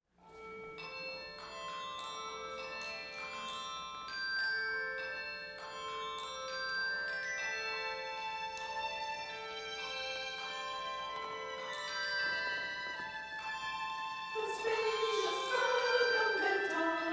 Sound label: Spacious, SATB